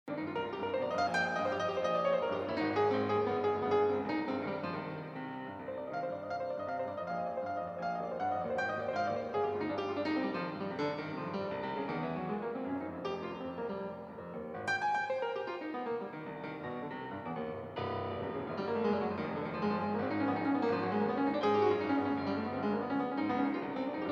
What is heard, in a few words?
Concert Samba